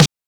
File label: snare di$co; neuro; Sinistarr; Drums